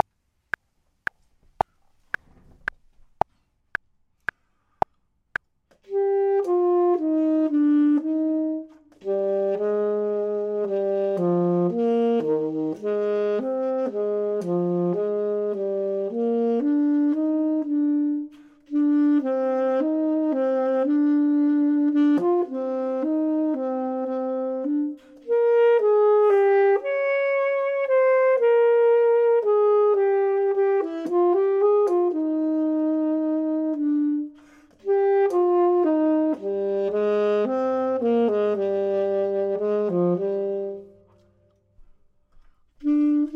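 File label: bottom, Saxophone and Clarinet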